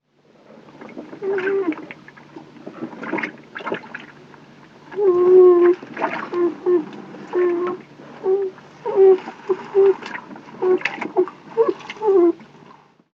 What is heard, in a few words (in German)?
Biber Jungtier Betteln; artenkabinett